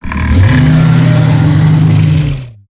creature stand up howl; snowstalker

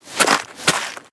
creature snowstalker baby turn

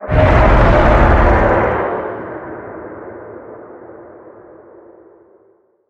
creature hidden croc callout

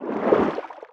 creature trivalve swim slow

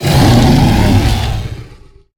creature snowstalker distant call

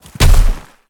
creature snowstalker run

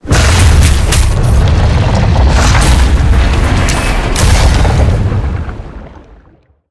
creature chelicerate seatruck attack

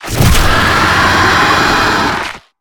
creature roar; chelicerate

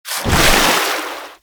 creature penguin dive deep

